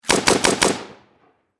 Warfare Machine Gun 7.62 Caliber Bren LMG Four Shots Distant Perspective